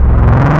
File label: Screamer, wavetables, Analog; Massive 3-Analog-Electric